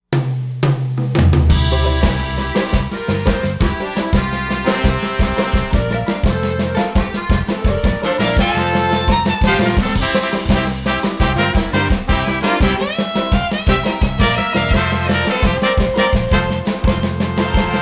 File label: Swamp Mushroom, Music; Frog Music Streamed